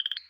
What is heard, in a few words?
chirp